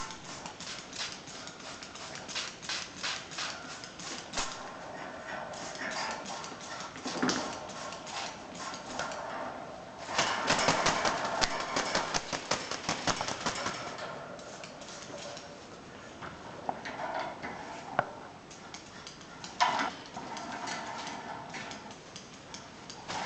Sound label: psittrichas fulgidus